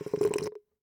Slurp

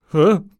Scared And Afraid Of Something

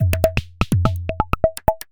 Rhythm maker loop 125 Bpm

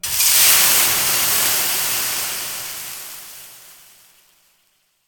Water Steaming On Hot Surface

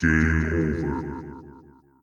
Game Over Terminal

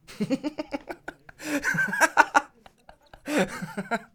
Man Funny Laugh

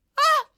Scream

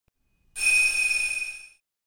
Sound Of The Bell

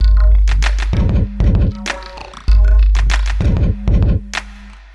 Bpm 97 Drum Loop

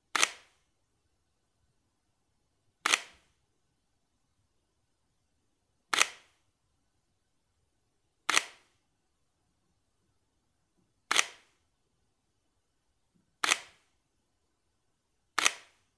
Sony A7 III Mirrorless Camera Shutter Sound; Satisfying

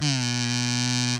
Kazoo

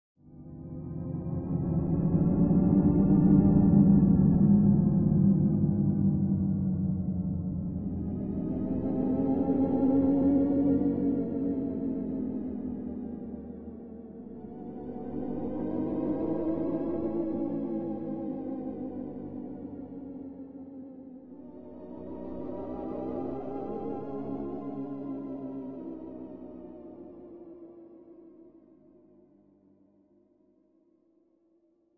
Ghostly

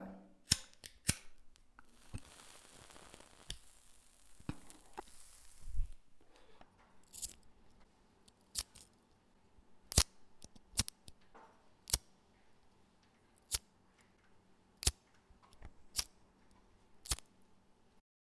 Playing With A Lighter

